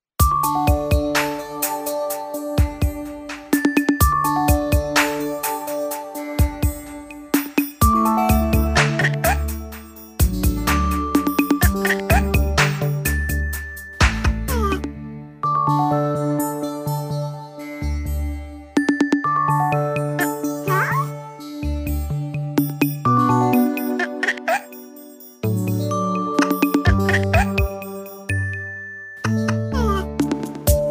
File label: Continuous bkgrnd; kidsmusic; Bed Time - Itsy Bitsy Spider